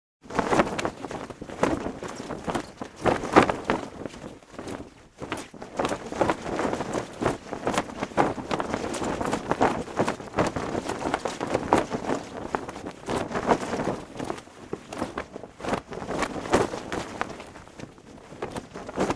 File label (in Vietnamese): am thanh tieng la co bay manh trong gio